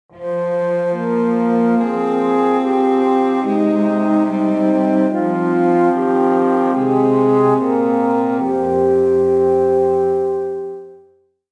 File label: Medieval Calm; Civilization Revolution